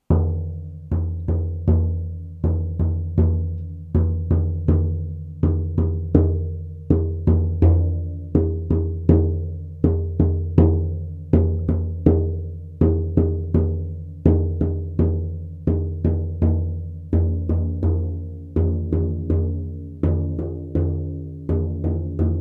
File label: Ovoid Reindeer Lunar Song, drum